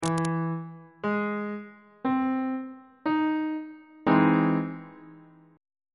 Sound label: d double sharp augmented major 7th chord on bass clef